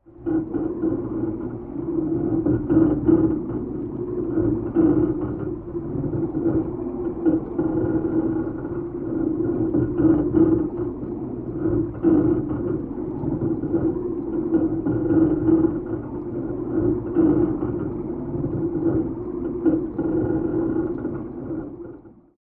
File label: Deep Sea Eerie Stress, bubbles